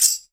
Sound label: Tambourine Acoustified; Drums; One Shots